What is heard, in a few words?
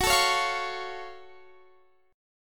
Gb Minor Major 7th, Guitar, Standard; strummed; capo 9